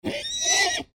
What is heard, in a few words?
horse neigh